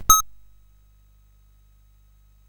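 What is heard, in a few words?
Moon Patrol, MOS 8580 SID chip music, games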